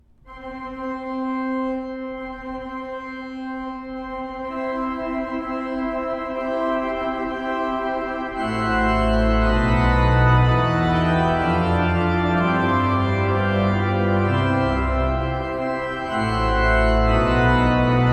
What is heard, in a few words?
Wedding March